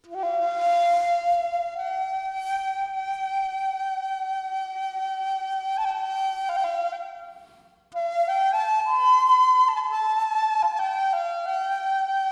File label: shakuhachi